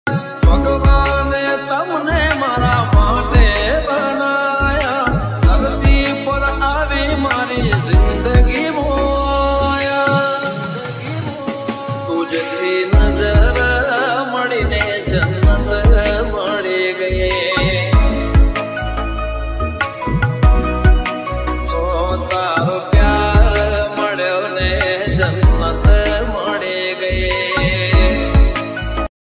jannat gaman santhal song ringtone gujarati new ringtone